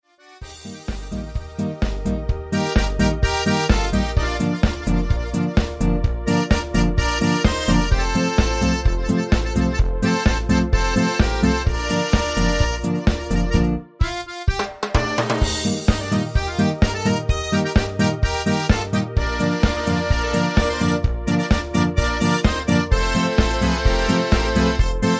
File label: accordion show, midi